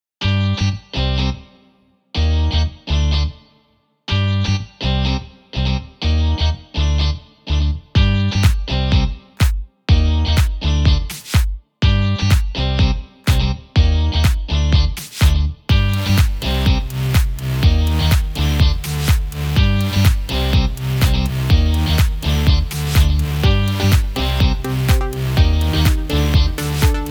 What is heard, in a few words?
Electronic Modern Beat